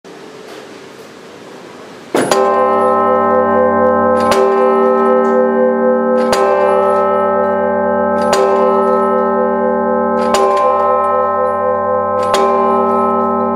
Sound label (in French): Sonnerie des 12 coups de midi